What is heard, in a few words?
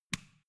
switch small turn on